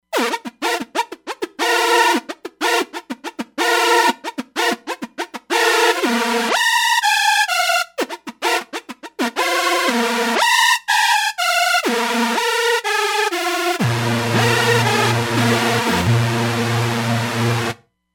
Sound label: Slipping Saws, Roland Fantom XR